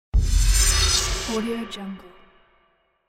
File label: horror sting